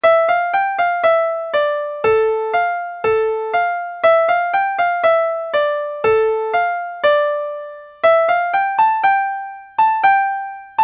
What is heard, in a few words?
kettle drum